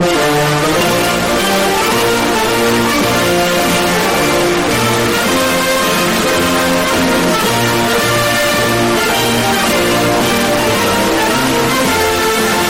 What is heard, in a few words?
Belgium Anthem, earrape; gmod; darkrp